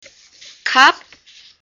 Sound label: cup